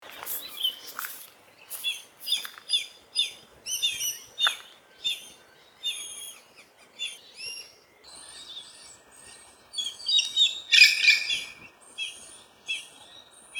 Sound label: Lophoictinia Isura; Square-Tailed Kite; Call